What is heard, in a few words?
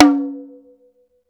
TOM, Drum Dry; East Collexion; Drum 1